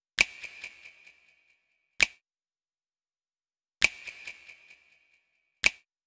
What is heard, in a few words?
Drum Loop 132 BPM Snap; Loop Stems; Cymatics; Vibes II Drum Loop Collection; Vapor